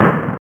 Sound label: Percu; alone in the dark 4